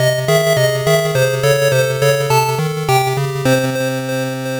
Unused Defeat Jingle; Hataraku Kuruma Diapet Daishuugou; Pico

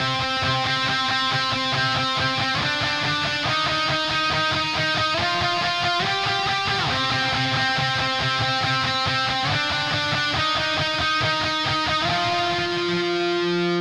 Drums and Jingles Camping Lead; Metal Tales Fury of the Guitar Gods

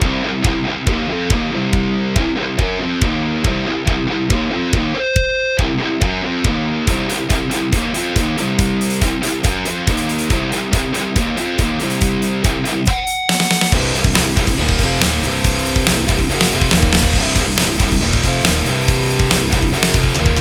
Heavy Riff; Kaiju Khaos; Heavy Riff 5 (Looped)

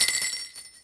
Medium Bell; Angry Birds Trilogy (3DS, PS Vita, PS3, Wii, Wii U, Xbox 360) (gamerip) (2012)

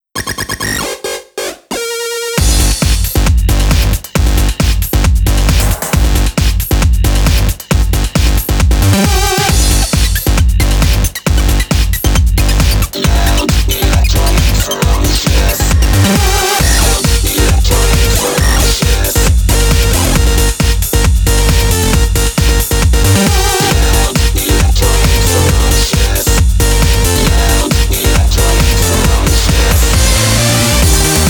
Loud Electronic Ferocious; Dance Dance Revolution SuperNova; V-Rare 9

